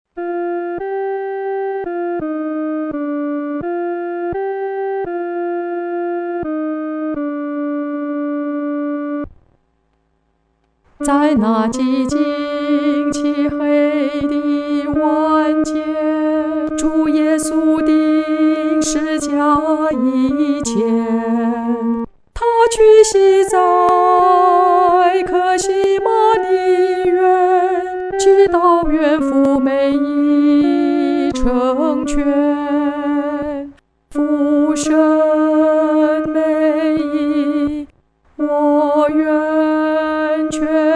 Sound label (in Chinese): 独唱 第二声